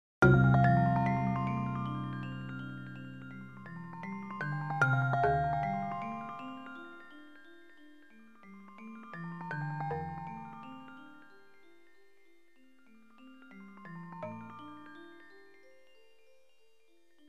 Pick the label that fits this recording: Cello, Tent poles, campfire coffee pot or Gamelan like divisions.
Gamelan like divisions